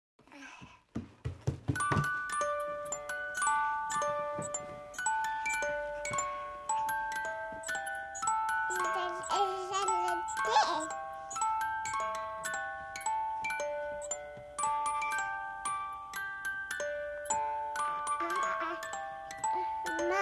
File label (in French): boite a musique